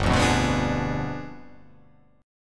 G 7th Flat 9th

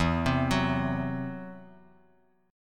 E Suspended 2nd Sharp 5th, Bass Standard; Esus2#5 arpeggiated; x,7,10,11

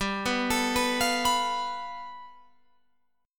G 9th, Guitar Open F; Listen to G9 arpeggiated; G9 Chord

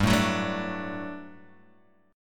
G Major 7th Suspended 2nd, Guitar, Open G

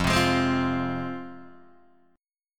F Minor, Guitar Open D; 3,3,6,6,3,3

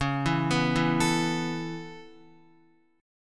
C sharp Minor 7th Sharp 5th C sharp Guitar; C#m7#5; arpeggiated; JGuitar

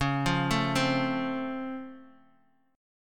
C sharp Augmented Major 7th, C sharp, chord; down; chord shape

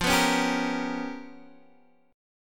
G 7th Sharp 9th Flat 5th

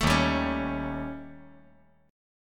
Eb 7th Flat 5th, Eb; 2,3,3,4; Eb7b5 strummed; JGuitar

